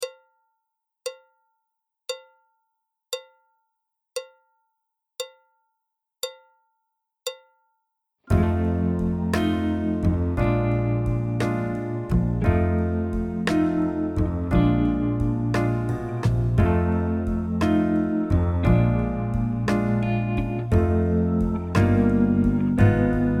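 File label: backing track, Jazz; alto, tenor, trombone, piano; Jazz Syllabus Level 2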